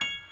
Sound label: piano high